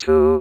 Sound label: wave